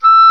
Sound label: Oboe, Orchestral Winds; Roland LCDP04 Orchestral Winds; WND Oboe f